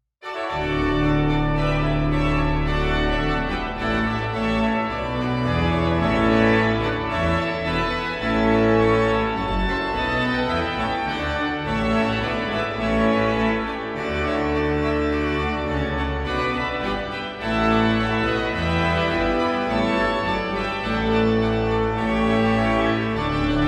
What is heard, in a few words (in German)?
Musikalischer Weihnachtsgruss